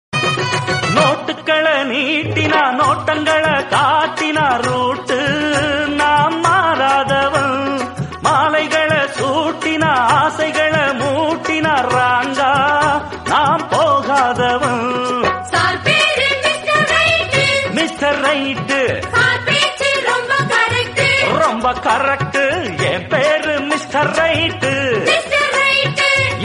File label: Tamil Old Song Ringtone; Pandiyan; Rajinikant